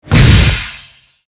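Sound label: jetpack player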